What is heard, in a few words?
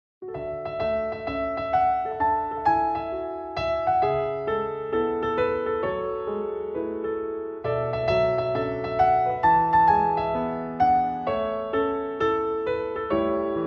piano ver.